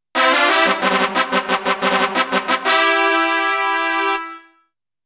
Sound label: Puchiguso Race Fanfare (Start Time); dot hack